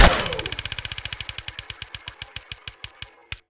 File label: chaingun wind down; tf2